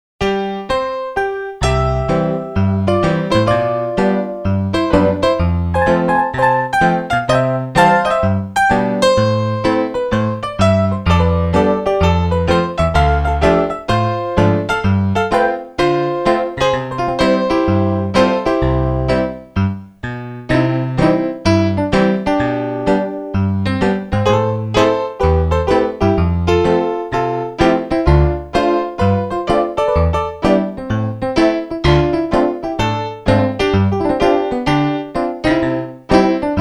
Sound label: Piano inst.